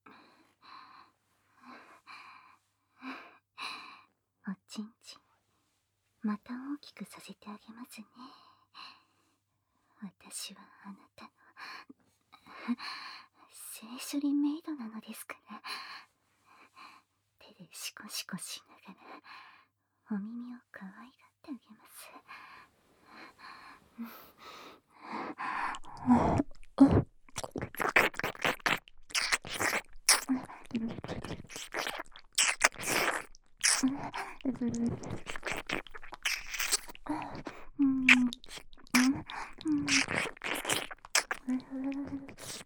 耳舐め 手コキ 爆音フェラ; 性豪メイドと連続フェラ交尾♪; バック; KU100; 性ご奉仕担当クールメイド・小鳥遊ゆきほの性豪激ヤバ逆レイプ! ～お坊ちゃま、私の性欲の強さを知ったからには無限に絞り取らせていただきます～

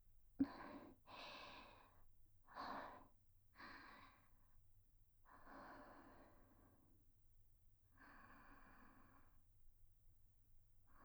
添い寝（寝息）ループトラック, ASMR; 耳かきASMR✕裏オプ援交～人気耳かき店の看板JKとリピ限裏メニューで恋人同棲生活してみたら; フォーリーサウンド

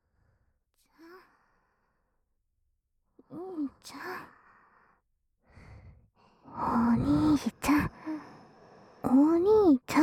寝起きの両耳舐め, ASMR; おもらし

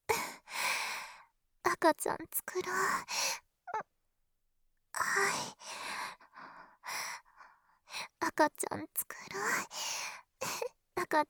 喘ぎ, ASMR; 【赤ちゃん作ろ♪】お屋敷メイド絆の子作りおねだり生ハメ中出しえっち♪; オナサポパート

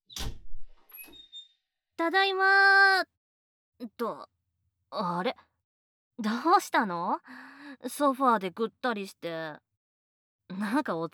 マッサージからイチャイチャ絶頂

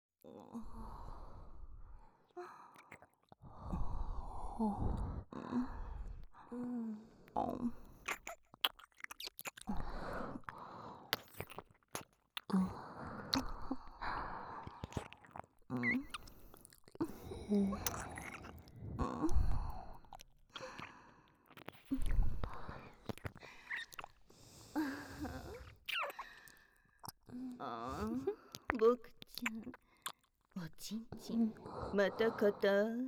耳舐め&キスしながらのフェラチオ(BGM無し); 【スーパー甘園房タイム】ゆかりママを呼んで

